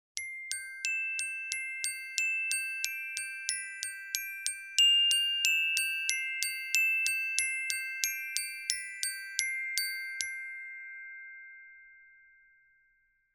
nezhnyj rozhdestvenskij perezvon

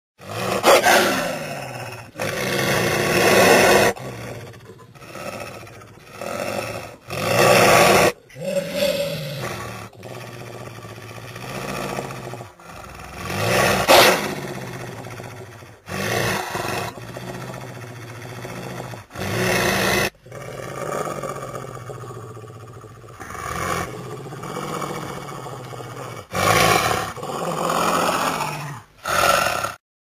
jarostno skalitsa hripit

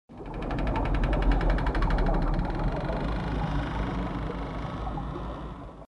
zvuki skripa i zvuki vody

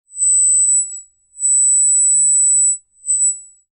jelektronnaja obratnaja svjaz

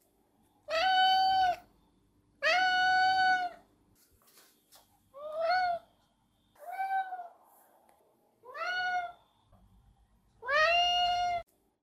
golos vzroslogo kota; samsona

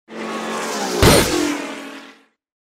multjashnyj zvuk naezda priblizhenija

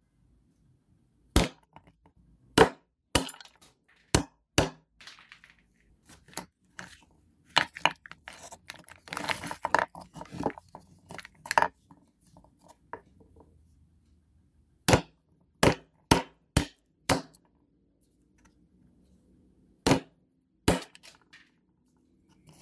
razbivajut orehi

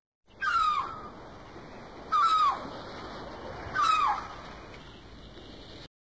kriki chajki na fone voln

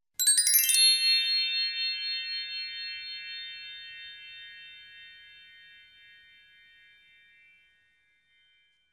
zvuk sijanija

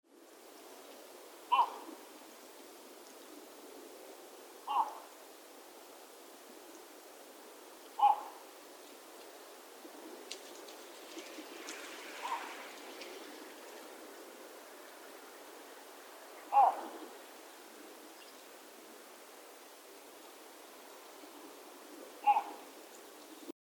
korotkoe kau v daleke

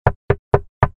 ostrye palcami polaja dver; Звук стука в дверь

